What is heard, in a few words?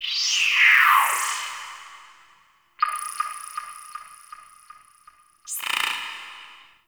Class Lesson Slide